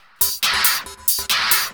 percussion loops, 138, Techno

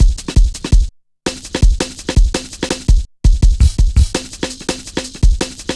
Rollin Shaker, Drum Loops 166.5; Jungle

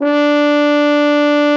bucket D4; Xsample VOL-3

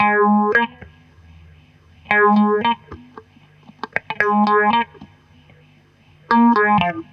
GTR2; USB Soundscan vol.32; Roots Disco; AKAI

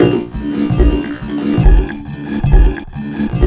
AFRIKAN; USB Soundscan vol.33; AKAI; Disjointed Textures; RYTHME

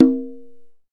conga, deep congas; Roland LCDP14 Africa VOL-2

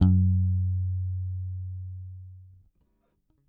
Whole Note One Shot F#, Bass; Bass X

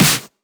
Snares; Vengeance - Essential Clubsounds Vol.4

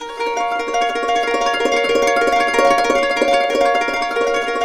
BISBI, Complete Harps; USB Soundscan vol.62; AKAI

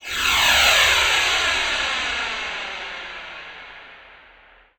sfx sci fi; Masterbits - Switch FX

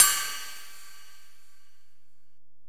Ride, Alesis DM-PRO, Drum Machines

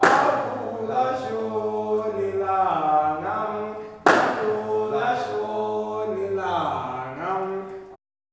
MALE CALLS; Spectrasonics; Heart of Africa 2

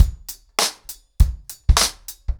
100BPM, ACOUSTIC DRUMS; The Roots Drumloops

